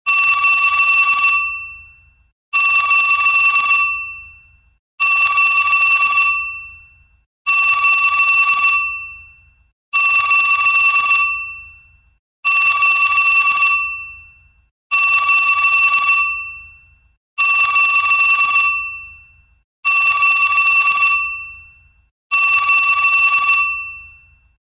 Vintage Telephone, ringtones

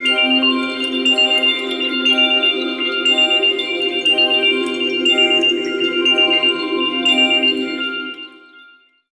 Alarm, alarms; Fujitsu F-08D; Disney

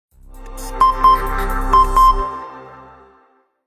Message, Nokia 5530 XpressMusic

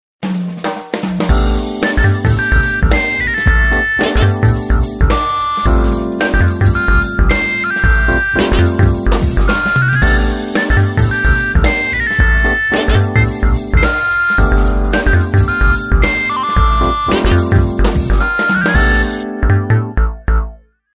Wine bottle, Samsung phonetones; GT-C3590

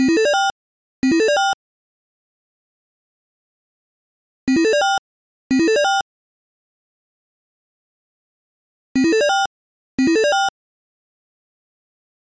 Insert Coin; LG Qua tab PZ LGT32; ringtones

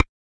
Keypress; Pantech Vega-Secret-Note-2

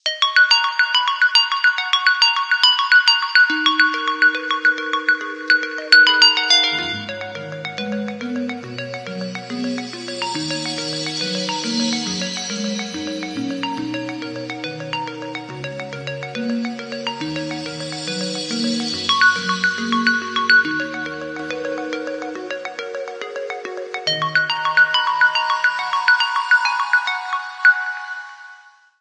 Passing Rain, Ringtones Ambient; SKY; IM-U680L

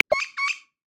Orange-Winged Amazon; Xiaomi Redmi-Note-8-Pro

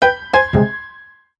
Blow, notifications; Meizu MX4

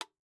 timer Clock; Vivo X80-Pro

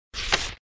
open; LG; SU780 Lollipop 3

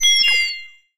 Low Battery

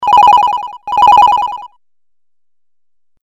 Sleepy Cat Beep, phone tones; LG GB258

